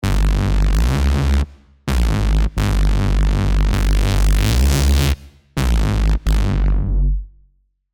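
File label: bass, Reese, Sylenth1; Maximum; alonso 19 year anniversary pack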